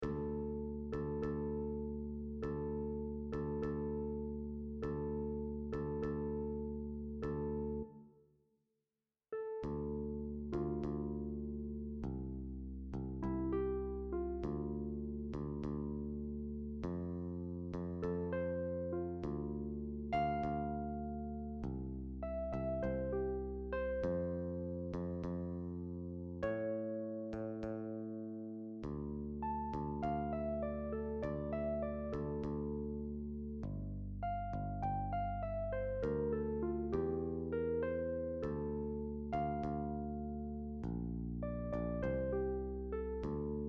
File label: Uke